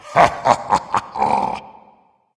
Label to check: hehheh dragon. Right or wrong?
Right